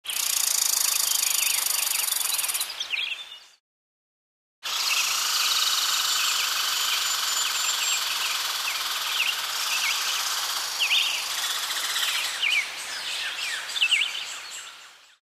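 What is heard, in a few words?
chipmunk sound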